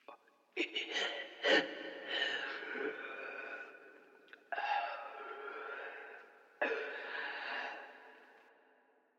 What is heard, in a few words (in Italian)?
lamento uomo; Inquisitor 3